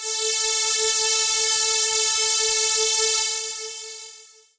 saw lead, synth notes; Techno Trance Essentials

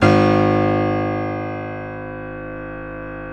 PNO FF G#; Synclavier Sampler Library

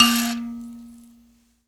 PLK Grand Mbira

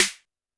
TD-20 Tom A, Roland TD-20 Toms

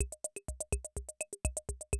CR78 125, drum loops

